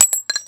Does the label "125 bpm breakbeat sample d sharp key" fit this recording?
No